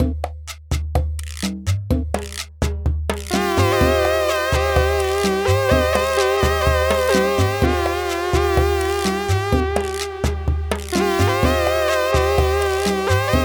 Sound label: Cumbia Fantasma Loop